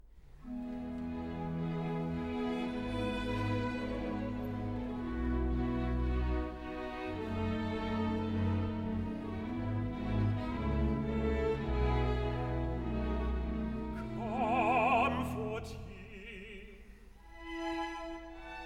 tenor; part 1, no 2, comfort ye my people

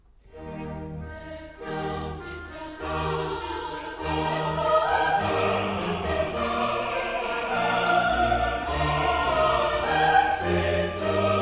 chorus; part 2, no 39, their sound is gone out

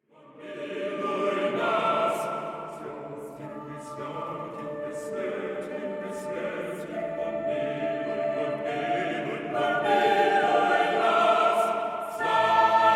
hymn; trisagion

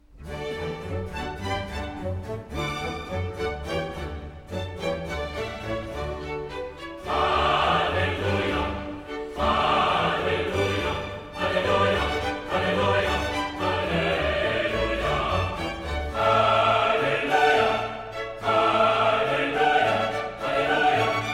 chorus; part 2, no 44, hallelujah

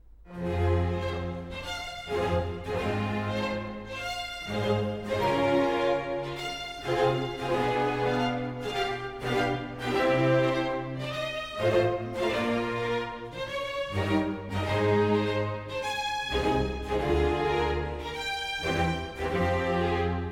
surely he hath borne our griefs, chorus

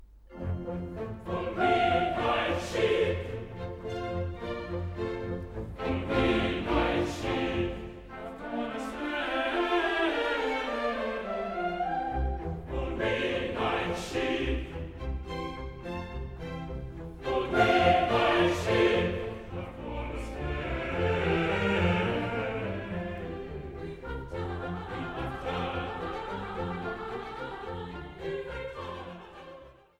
all we like sheep have gone astray, chorus

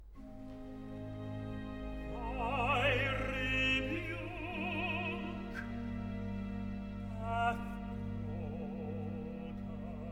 tenor; part 2, no 29, thy rebuke hath broken his heart

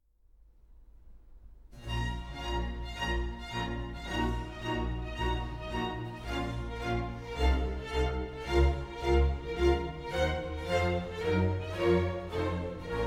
tenor; part 2, no 27, all they that see him laugh him to scorn

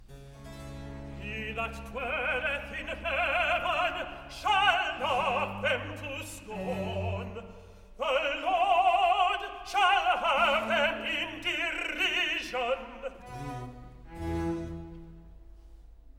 he that dwelleth in heaven, tenor

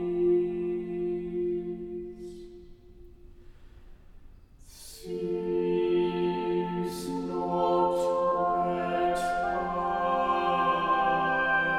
drop drop slow tears

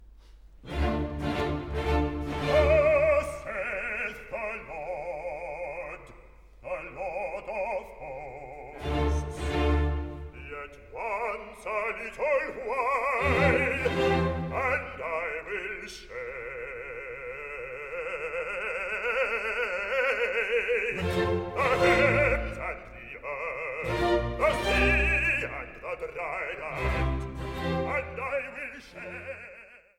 bass; part 1, no 5, thus saith the lord

